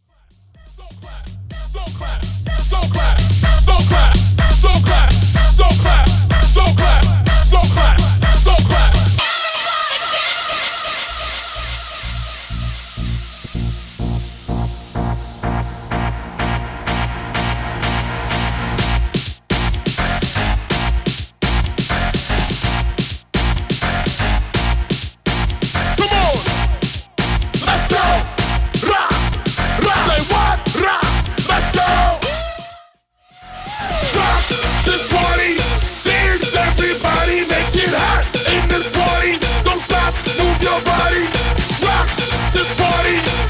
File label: Beatshow 125bpm